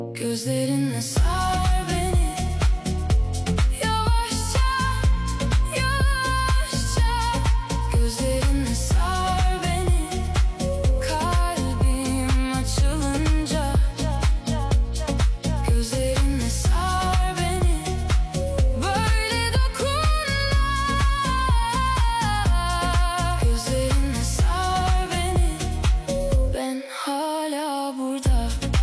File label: Turkish X Afrohouse